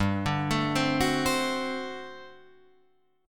G Major 7th Suspended 2nd Suspended 4th G down Guitar Open F; GM7sus2sus4 arpeggiated